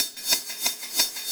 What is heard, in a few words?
Cymbol Particle; Particles